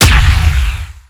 snowball stun; tusk

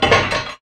foley, footsteps; rattletrap

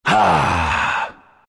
announcer wipe sigh, pirate; dlc workshop; capn

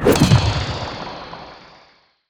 parry block; weapons; dota; kez

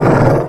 death junglestalkers, creep deaths; dota

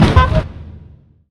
whoopee cushion landed; weapons; dota; ringmaster

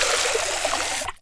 tonic projectile; weapons; dota; ringmaster

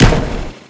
attack; dota; monkey king

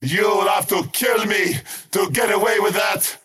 skywrath crown deny pain; skywrath mage

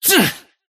attack pain; dota; kez

